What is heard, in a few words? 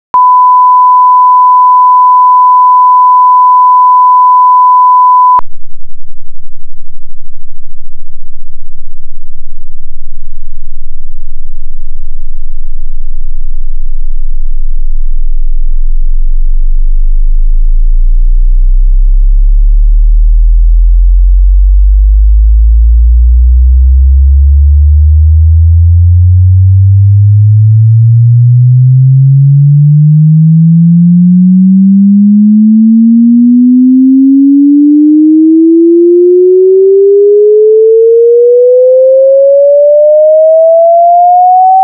Sweep Frequency Response